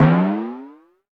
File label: Belly Bounce Timpani